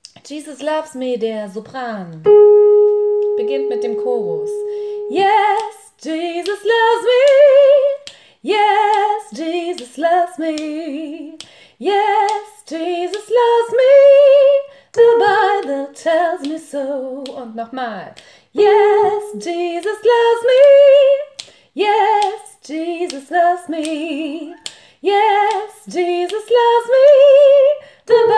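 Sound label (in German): Jesus loves me sopran, gospel choir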